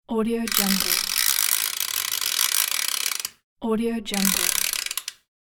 ratchet

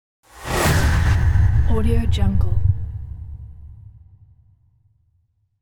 cinematic whoosh

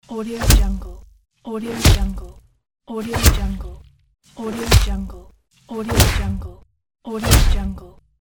deadly blows

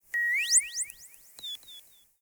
electronic beeps alien birds chirps

